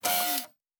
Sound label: servo small, sci-fi sounds, mechanical